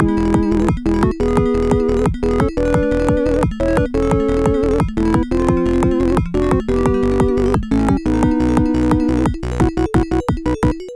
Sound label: adlib loop